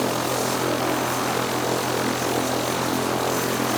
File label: propeller small